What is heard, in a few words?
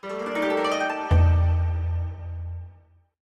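Skill background music; Naruto Ultimate Storm; android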